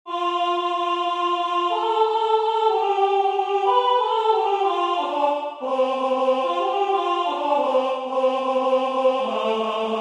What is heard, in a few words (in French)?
alto